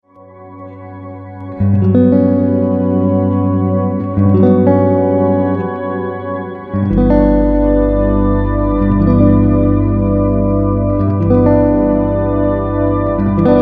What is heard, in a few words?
hard limiting filter; mars